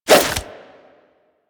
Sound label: Nunchuck End Whoosh B, Infested, Weapons; Warframe